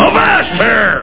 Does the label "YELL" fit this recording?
Yes